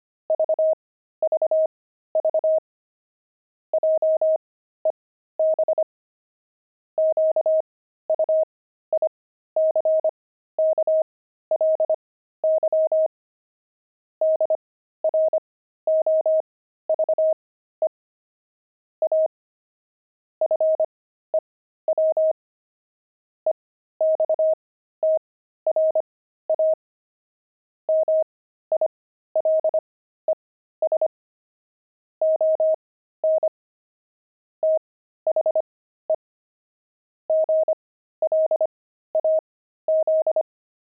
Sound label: pangram, intermediate pangrams files